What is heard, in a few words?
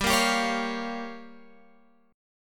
G Minor 7th Flat 5th, Guitar Open G